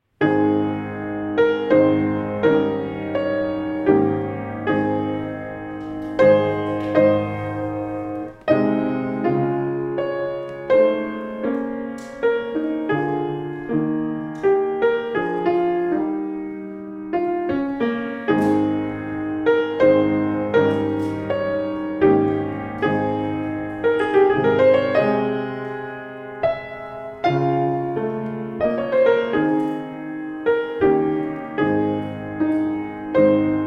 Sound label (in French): trp et piano; haydn